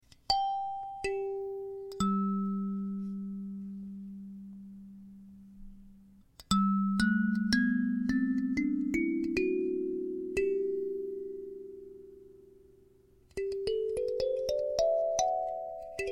Cel Alto, kalimba

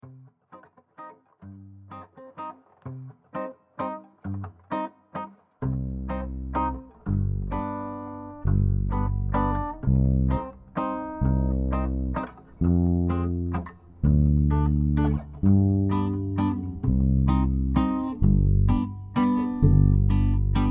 Freygish Song BACKING; Freygish-Tuned Karimba; Hugh Tracey kalimba; Freygish-Tuned Karimba: Farewell Song

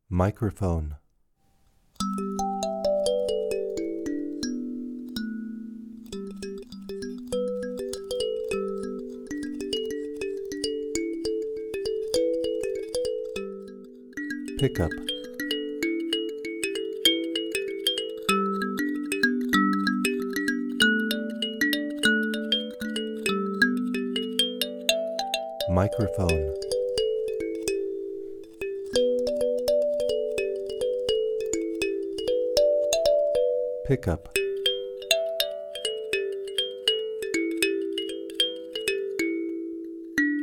Cel Penta G, kalimba

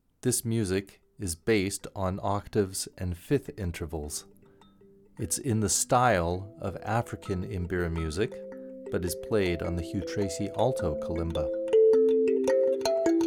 Octave 5th example

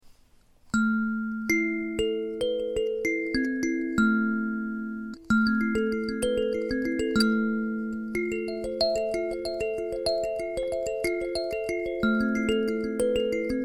Naked Sansula; Hokema

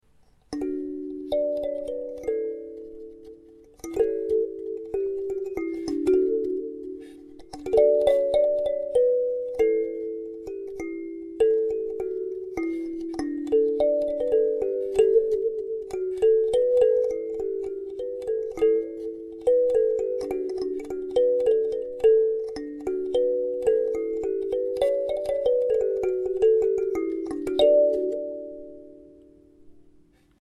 Goshen 8 Note Box, kalimba

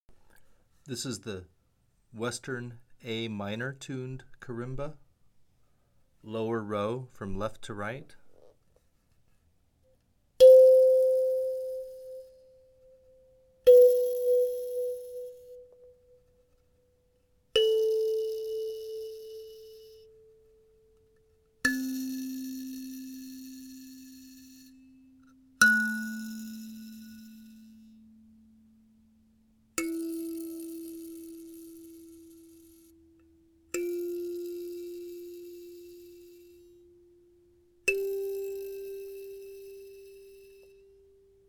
karimba A minor

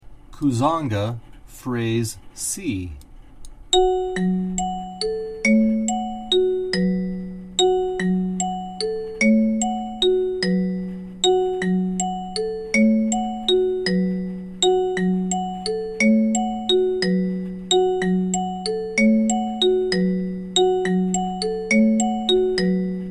Kuzanga Prog C, kalimba

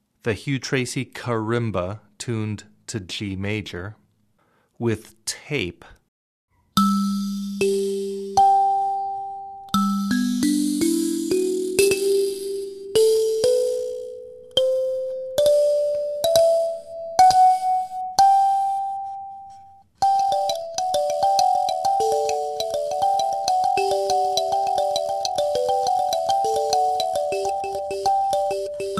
Karimba G Tape

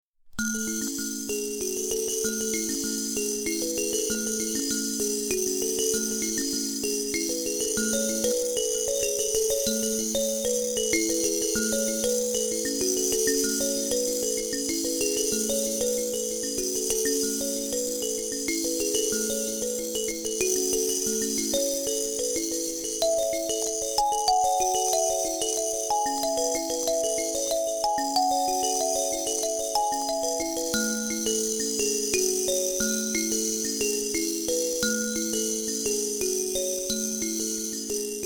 Karimba Western A

